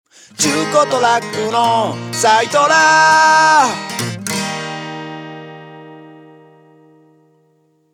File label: soundlogo; saitora